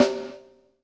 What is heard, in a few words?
HIT VERB, PROC SNRS, Drumworks; Sampleheads; New York City Drumworks VOL-1